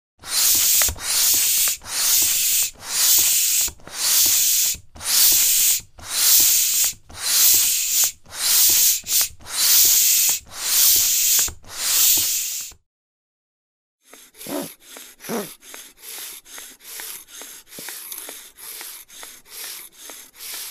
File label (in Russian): zvuk nasosa dlja velosipeda